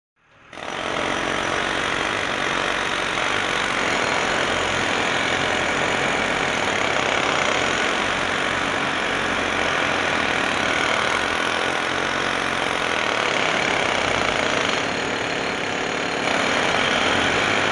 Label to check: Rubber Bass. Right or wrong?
Wrong